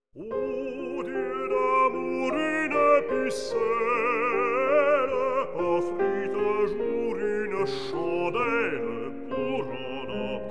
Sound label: song cycle for voice & piano; Chansons gaillardes; 6. L'Offrande